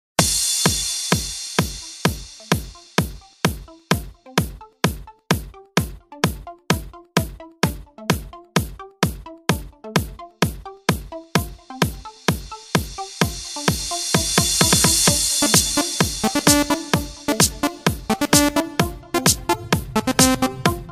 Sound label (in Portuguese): eletrofunk